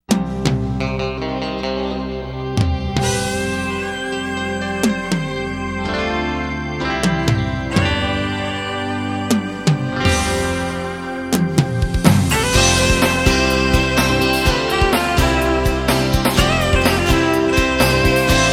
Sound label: Fiddle Rock